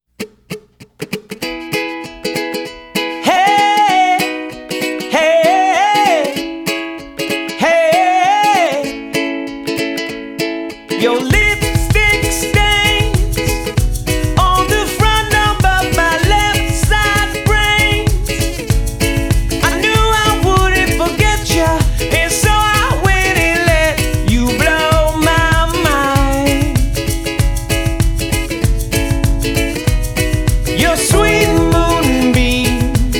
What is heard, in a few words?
live acoustic